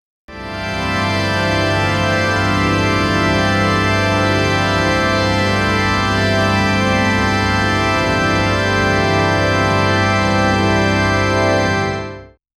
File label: organ chant, choir; here we go organ chant